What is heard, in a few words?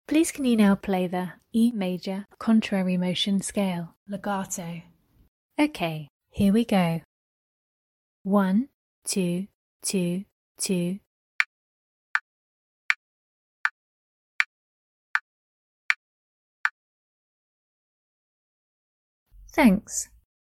e maj cont mot scale legatto